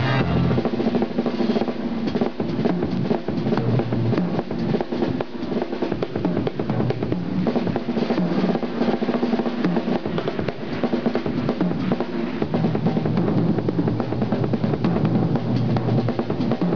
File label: drum solo